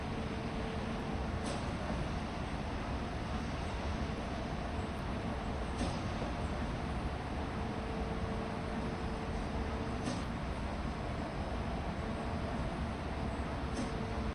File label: Alley Factory Ambiance